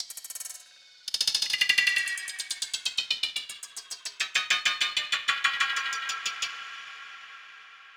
granulized glitch

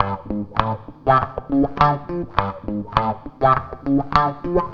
WAH, WAHWAHGTR

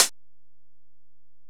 HiHat, Alesis DM-PRO

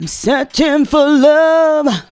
FEM HO, Female Hooks

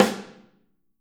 Snare OH; Snare OH All; Drums Percussion

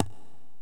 Korg x5, Drums

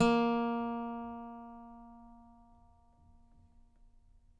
HST F A#4, Whole Lotta Country; Sampleheads; Whole Lotta Country 1 & 2; HISTR NOTES

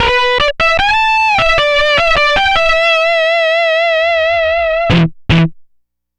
Disto Guitar, Guitar Synths; Dr. Fink's Funk Factory

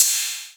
Ride, Roland R-8

